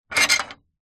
household gate bolt turn; zapsplat

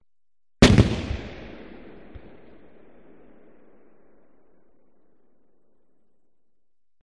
Gun Cannon; audio hero

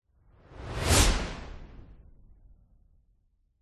sound design transition whoosh med fast; zapsplat

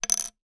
foley token plastic down spin wooden table

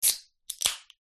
soda can open ring pull

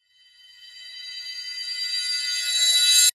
sound design riser metal high pitched reverse

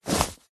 clothes jacket thick high vis set down; zapsplat

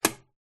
household rice steamer switch

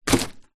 nature ice snow impact spike; zapsplat

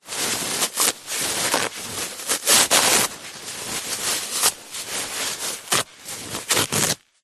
household toilet paper mass rip tear; zapsplat

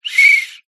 sport whistle soccer weak blow

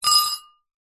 cutlery item set down into ceramic bowl; household; zapsplat

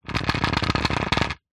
household broom plastic drag concrete

